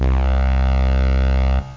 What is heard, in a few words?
roland d50 velo brass, synths; Zoom - Release 2 (1996); Active Software